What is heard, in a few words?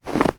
snow hit; everness